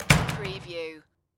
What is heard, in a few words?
Door Stairwell; Sound Effects Bible